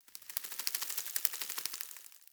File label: Day Insect Close; Ambience; One Offs; Glades Of Andurin